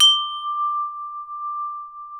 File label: G3 STRUCK, STRUCK CHMES; AKAI S6000 CD-ROM; WorldSounds